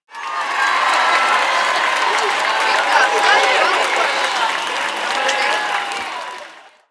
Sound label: crowd celebrate; Immortal